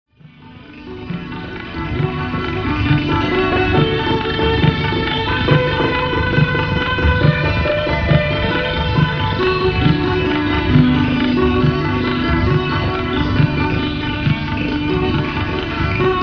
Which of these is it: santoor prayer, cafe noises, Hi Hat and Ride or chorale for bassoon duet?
santoor prayer